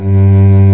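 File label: SOLO STRING G1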